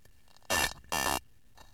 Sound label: Linuparus trigonus rasp